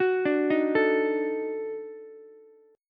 D sharp Minor Major 7th Flat 5th D sharp Ukulele; arpeggiated

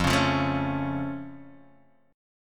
Db Augmented F

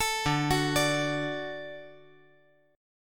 D Major D down

